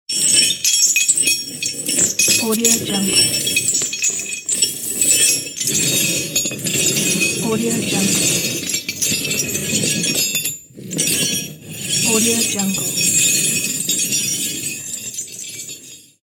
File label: metal chain